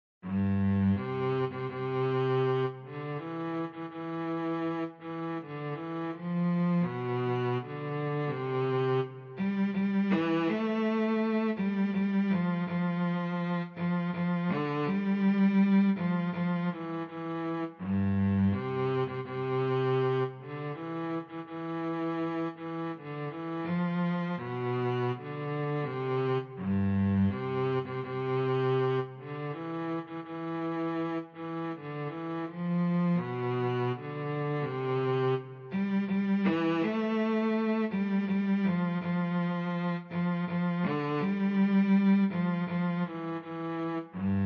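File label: solo cello; O Tannenbaum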